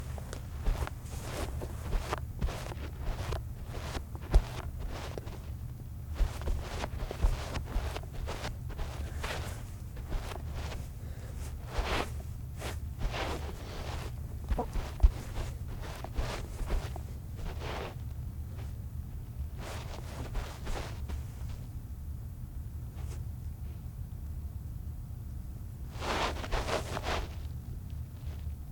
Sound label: Walking And Running Footsteps Tennis Shoes Carpet Floor